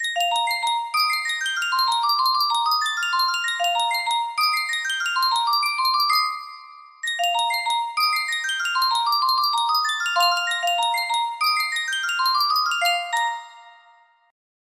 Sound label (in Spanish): Yunsheng caja de musica, music box melody